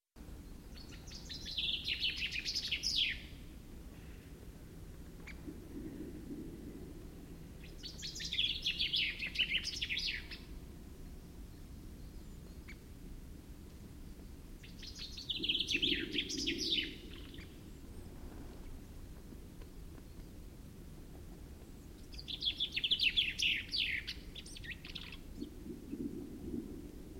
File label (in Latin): Fringilla coelebs; SETE